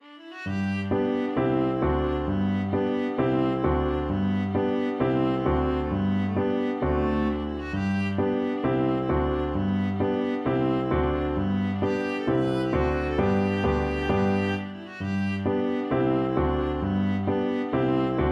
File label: viola